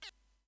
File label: limpet; vux